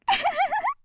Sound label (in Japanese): あはははは, 笑い声